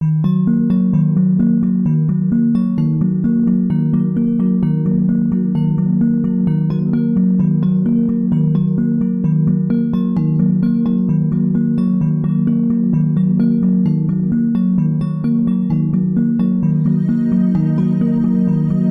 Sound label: Drone, Light